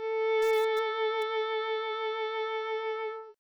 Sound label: violin; vibrato